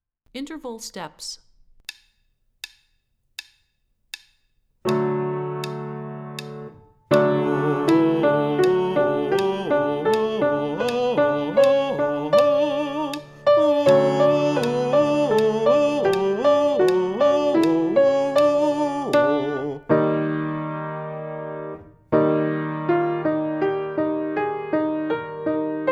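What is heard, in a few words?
Interval Steps High, vocal warmups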